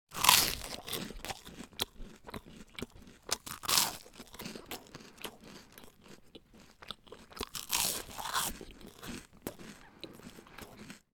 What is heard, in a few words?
human eat crunch toast chewing; sound effects one